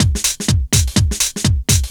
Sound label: DRM 125, House; Best Service ProSamples vol.10 - House; AKAI